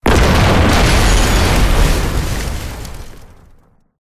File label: explodes; gow; fortifications; centaur